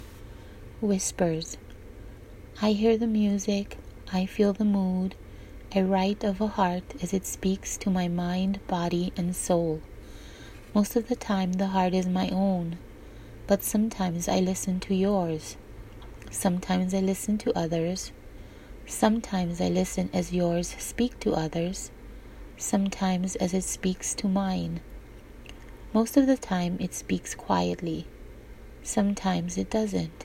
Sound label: Whispers